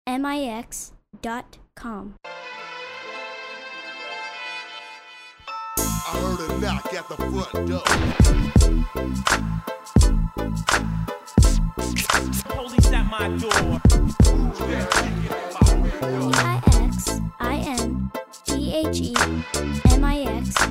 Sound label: choir (knock at door)